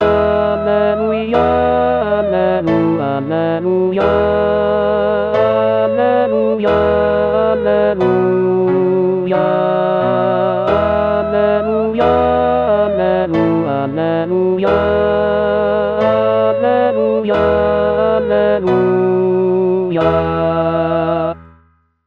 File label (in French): alleluia basse; de taize